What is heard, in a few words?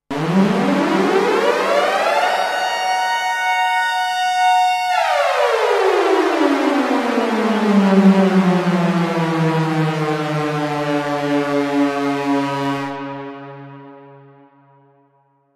Outer Space Alarm